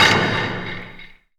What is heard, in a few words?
Impacts